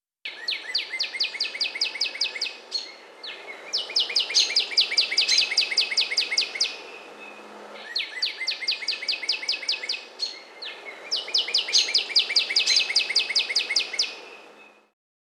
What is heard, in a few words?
natural sounds